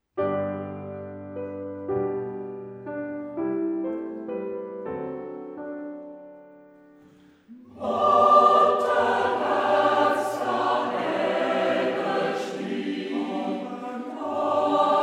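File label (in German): siebenbürgische Kantorei; Bariton; Klavier; Ortsmundart: Hermannstadt; Klavier: Prof. Heinz Acker; Hegt wird gesangen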